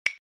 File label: hover button